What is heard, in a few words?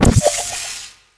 portal gun fire red